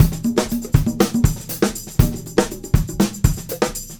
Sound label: Combo, R&B Drums